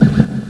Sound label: Move Wall; Strategy; Maze; Gamma Zee 2.5.1ß